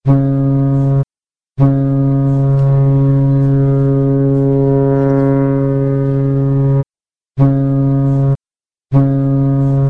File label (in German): kurz lang kurz kurz, schall